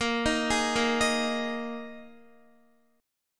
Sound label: Bb 7th Suspended 4th Bb Guitar 4E,4A,5D,5G,5C,6F